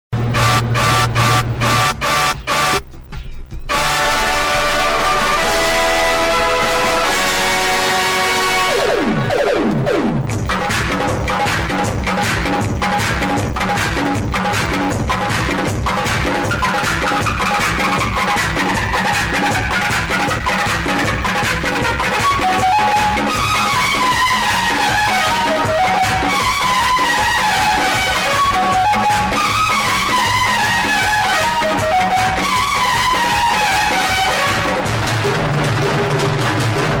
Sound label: open noise; kunstvlaai 2006